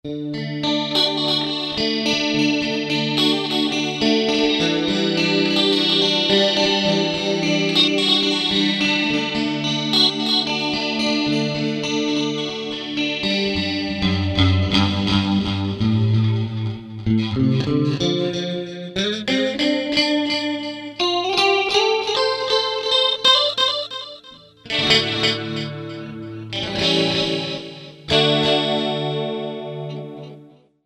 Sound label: CLEAN DELAY